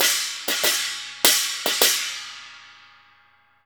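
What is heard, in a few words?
CHINA LG17 R, China cymbal; Spectrasonics; Heart of Asia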